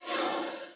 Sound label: Soft Slide Medium